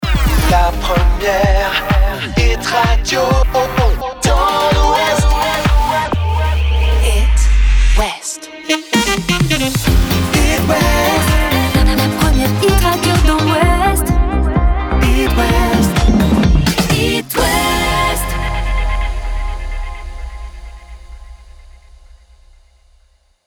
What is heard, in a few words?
saxy house main; Hit West